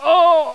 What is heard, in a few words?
DIE; Caesar III